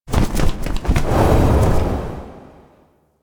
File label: Owl Dive; Duviri; Animals